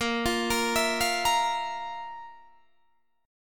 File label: F Major 7th Suspended 4th Bb